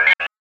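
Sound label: sphere blips medium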